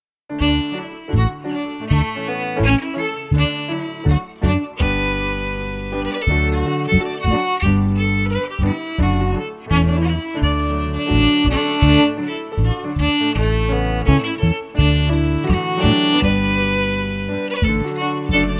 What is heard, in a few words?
FIDDLE PIANO; Jink & Diddle Book o' Tunes 2022